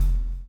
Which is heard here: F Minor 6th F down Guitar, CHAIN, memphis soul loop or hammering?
CHAIN